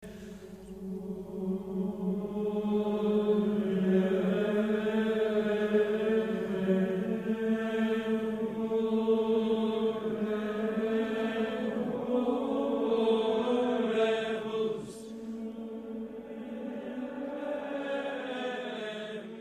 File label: backwords monks